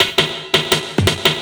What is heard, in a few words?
LOOP SD, 168 BPM, Drum & Bass